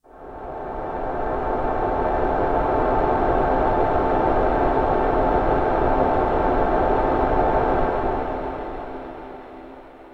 Ambient Pad, Drum & Bass Melodic Synth

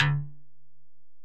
Bell, Alesis DM-PRO

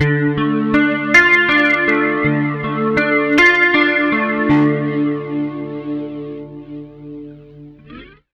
80 MIN ARP D, Acoustic Guitar Loops; USB Soundscan vol.04 - Electric & Acoustic Guitar Loops; AKAI

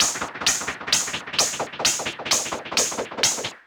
Modular 130BPM

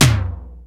Kit Tom; Digital Sound Factory Xtreme Lead

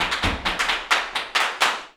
flamenco tapping, jaleo

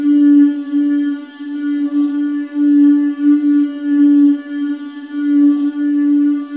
LOMONIOUS, HARMONIOUS; Spectrasonic Distorted Reality 1

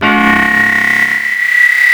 PIANO FX; Big Beat Samples & More; AKAI; KEYBOARD KIT

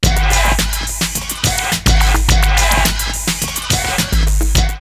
Shout & Roll 106, drumloops, Rave; X-Static Goldmine; 90 s Sample CDs

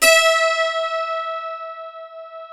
DULCIMER E5; SoundBytes; Early Patches

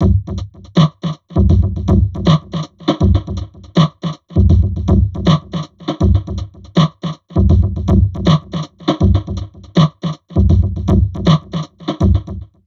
Drum Loops Crazy Processed

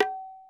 perc, Roland MC-909

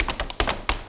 STEP + CLAPS, MSTEPS+CLAPS

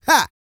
GRUNT, MALE HUMAN; ILIO; Vocal Planet

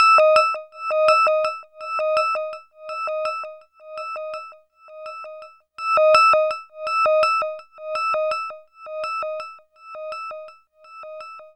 SYNL, Drum & Bass; Best Service ProSamples vol.03 - Drum & Bass 1; AKAI

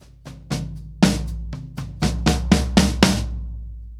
Ballad Fill, Drum, 70's Ballad; Sonic Foundry (Sony Creative Software); Drum Tools; Laidback

